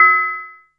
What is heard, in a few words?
DULL, PERC HITS; Zero-G Ambient 1